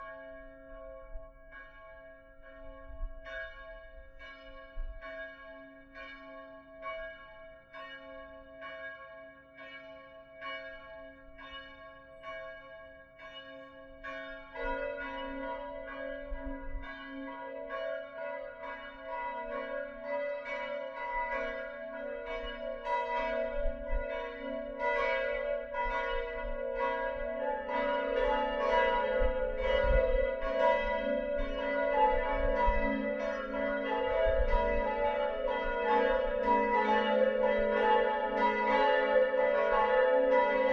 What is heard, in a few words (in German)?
glockengelaeut, kirche; st petrus und paulus neuhausen; pfarrkirche st petrus und paulus